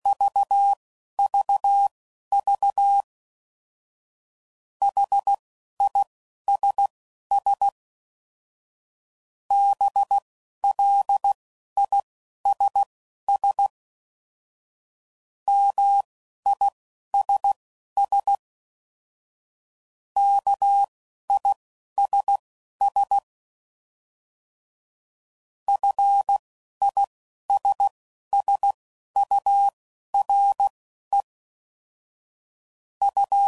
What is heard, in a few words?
CW Code Groups 10WPM; Mixed; Suffixes